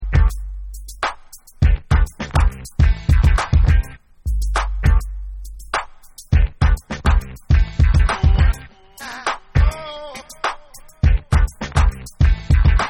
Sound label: Instrumental